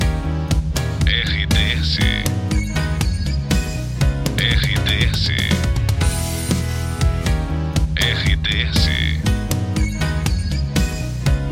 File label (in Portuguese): trilha para locução; Rádio Panorâmica FM de Campina Grande, Paraíba, Brasil